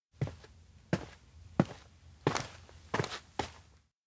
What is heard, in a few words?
steps outdoor observatory walk; anemoi